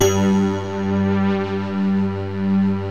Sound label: MYSTERY SYNTH